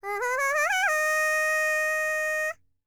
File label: C ASIA, World Voices